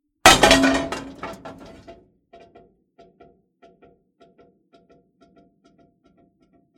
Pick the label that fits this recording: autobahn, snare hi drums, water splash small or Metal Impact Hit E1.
Metal Impact Hit E1